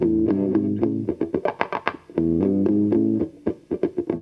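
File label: GTR right, Roots Disco; USB Soundscan vol.32; AKAI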